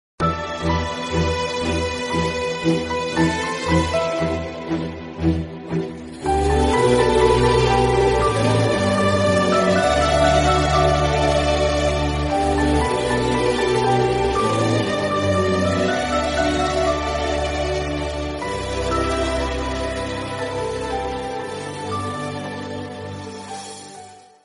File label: emotional bgm mobile ringtone; darling movie; telugu